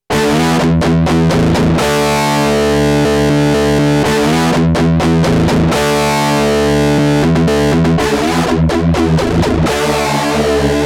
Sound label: Electric Guitar; KORG Dipole